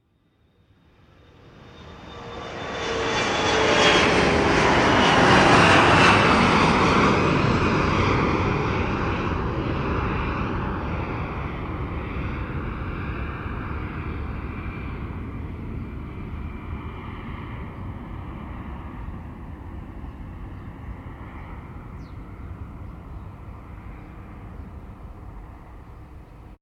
transportation aircraft commercial jet pass low external, Aircraft (Large Jet) Fly Low Overhead; Large Jet Airliner Fly Overhead Very Low; Airplanes; Vehicles; McKinneySound